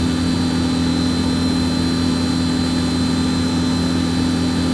CPU noise

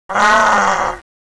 pain, baby

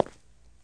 ninja step

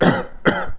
cough

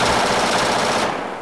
gun, weapons

